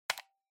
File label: Clock Alarm (Button)